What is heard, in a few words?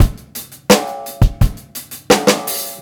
beat, Roots Funk; USB Soundscan vol.41; AKAI